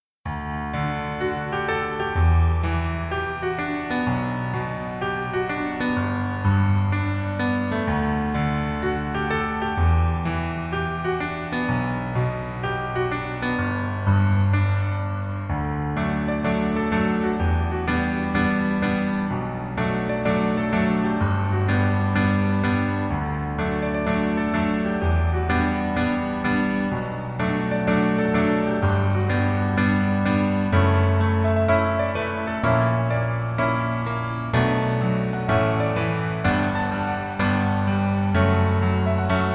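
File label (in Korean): Piano version 멜로디 MR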